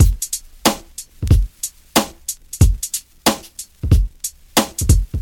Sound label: 92 bpm breakbeat f sharp key, kick tuned to the F# note. Loudest frequency: 2514Hz